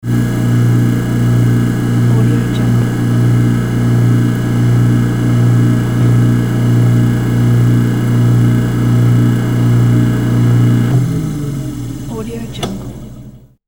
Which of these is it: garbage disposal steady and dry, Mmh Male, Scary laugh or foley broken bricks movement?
garbage disposal steady and dry